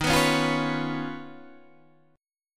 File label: Eb 7th Suspended 2nd Sharp 5th Eb, Guitar Open D; 13,14,11,11,14,11